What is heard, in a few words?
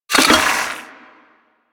Secondary Mech, Weapons Cannon; Kuva Zarr